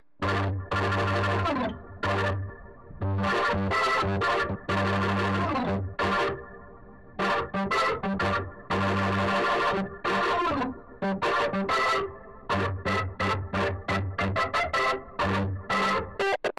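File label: wet organ, Multiband neural audio effect; prism distortion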